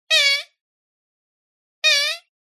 animals bird swamphen squawk wetland qld australia